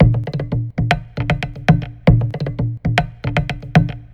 Bass Guitar Perc

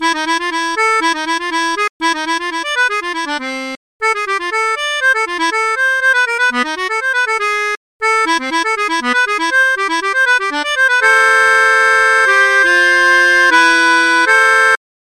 Melodica

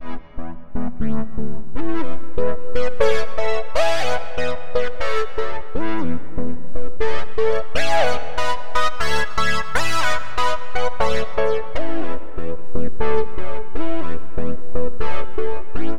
Triangle Instachord; AKWF

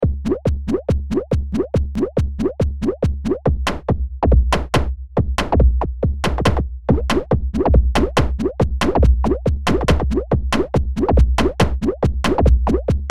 Drum Synth

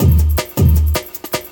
Think Bass pitched

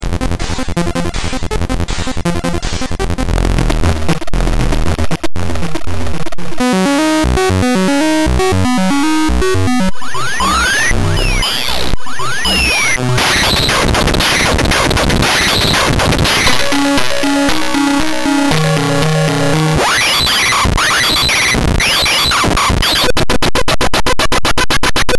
ByteBeat; Metalthrix

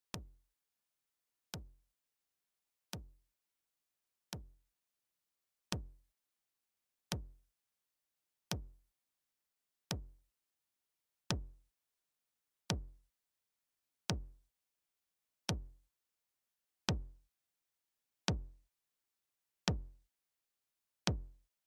off timing

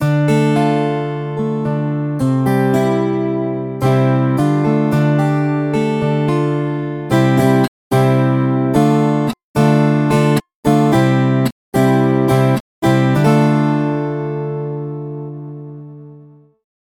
Viola Caipira, 10 String Guitar; Brunobrgs

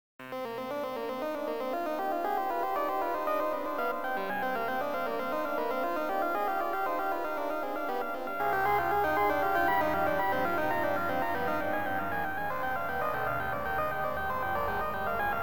9bit; Medievil-Music; Concerto Grosso

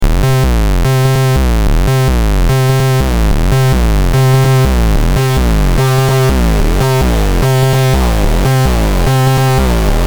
Synth Lo Fi Fighter; Metalthrix; QiTaNo

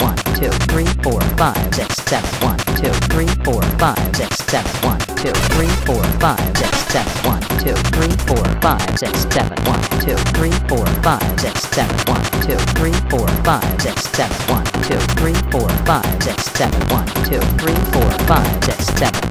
7 4 time

